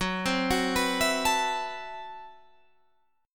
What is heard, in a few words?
A Minor 6th Add 9th Gb, Chord